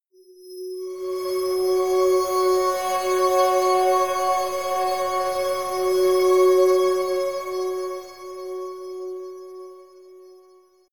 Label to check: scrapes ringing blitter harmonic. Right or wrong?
Right